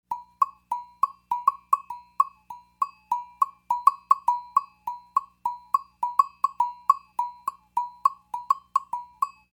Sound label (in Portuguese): AGOGÔ